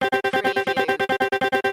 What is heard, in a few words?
Casino Electronic Sound Wheels Spinning